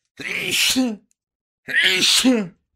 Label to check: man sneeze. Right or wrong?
Right